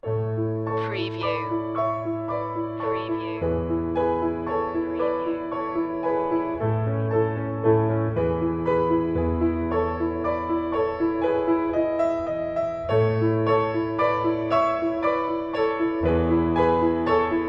piano sonata no11 a major kv331 1st movement variation 1; wolfgang amadeus mozart; classical music for media